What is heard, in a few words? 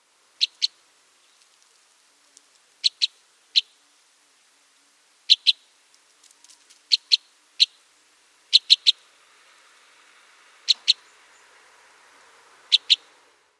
White-winged Crossbill, Loxia leucoptera; finches